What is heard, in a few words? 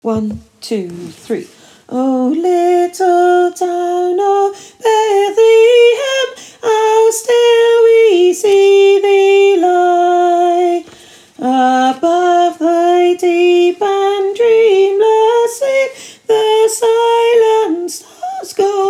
Soprano